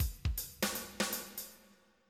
jazz; drum

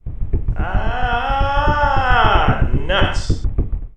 Sound guy scream

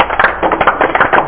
HandClaps; 8,363 sample rate; System Booster; Commodities; Bell; Amiga 8-bit Sampled Voice

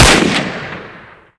weapon fire lee enfield; weapons; Spearhead Demo; MacFormat 2003 June

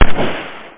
Rifle; CannonFire

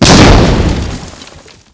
explo grenade; Spearhead Demo

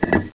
Tablet Click Lock; pyramid

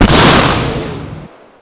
panzershrek fire, weapons; Spearhead Demo; MacFormat 2003 June

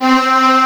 ensemble strings C3; Star Trek JR Audio

